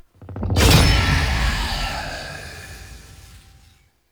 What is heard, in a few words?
Swarm UI Octopede Defeated; Warframe; Entrati